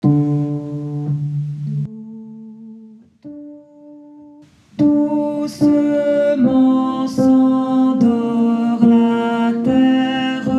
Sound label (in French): berceuse cosaque voix separees basse